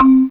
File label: Mar Block C4, Fairlight CMI IIe, Mallets